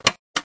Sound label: switch it on; Sound FX Real Life